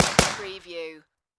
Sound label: Submachine Gun HK MP5